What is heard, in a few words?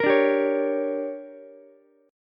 D Major 7th Suspended 4th Sharp 5th, Ukulele; DM7sus4#5; strummed; JGuitar